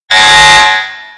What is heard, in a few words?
alarm; bsg